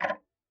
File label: Tick, Clocks Kitchen Clock